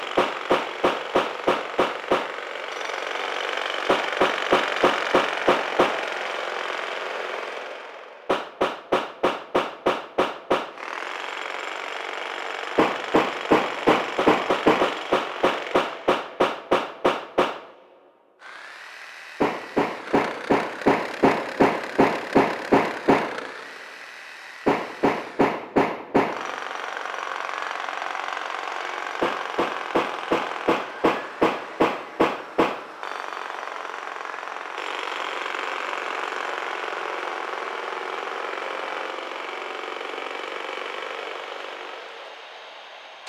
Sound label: Construction Sounds Soundscape